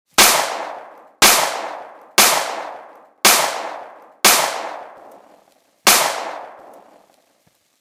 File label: Six Gun Shots; Stefan Roemer